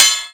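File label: TENT POST, percussion metallic; PERCMETALIC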